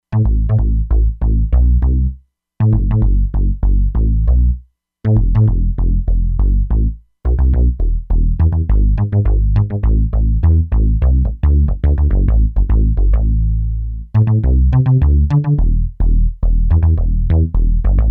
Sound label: Kool Bass; Factory Presets; E-mu Proteus-2